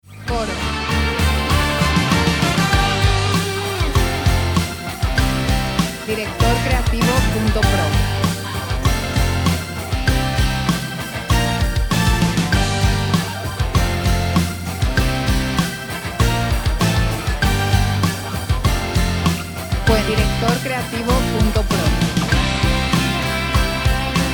98bpm 4/4 Bb

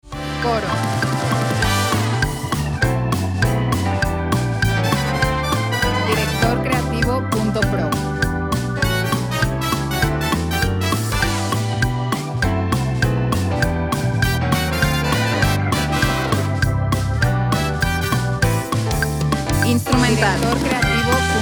200BPM 4/4 F

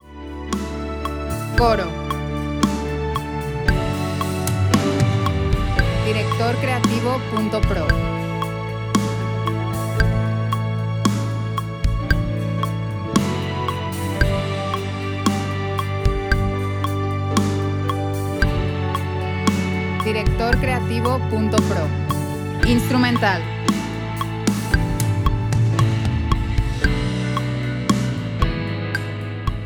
57bpm 4 4 D

